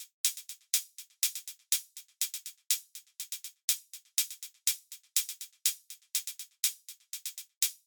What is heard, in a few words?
122 BPM Hats Hat Pulse; mmb studio soundtrack essentials